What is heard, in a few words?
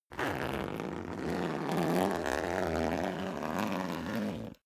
zipper down jacket open slow; objekte; reissverschluss